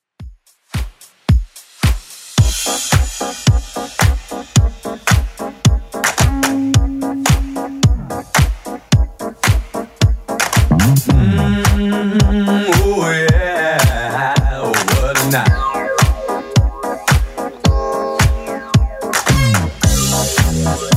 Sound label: 70s Redrum 110 bpm CLEAN